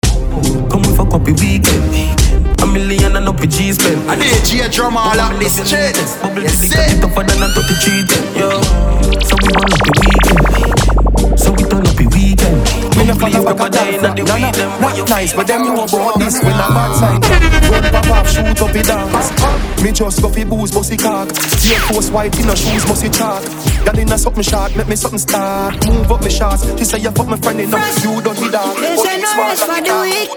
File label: Dancehall Mix